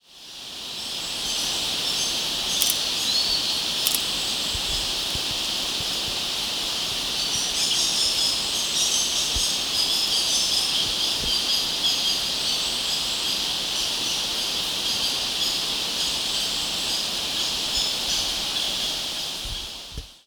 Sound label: ooakagera, bird song; オオアカゲラ; キツツキ目; キツツキ科; 新潟県胎内市奥胎内; J-eco 鳥のさえずり図鑑